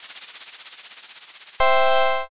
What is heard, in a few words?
answer roll